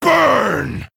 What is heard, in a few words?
male orc, Attack